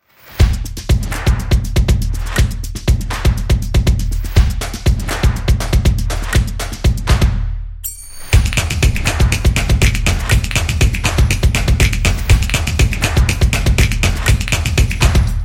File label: Drums Commercial